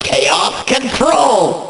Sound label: chaos control; jevil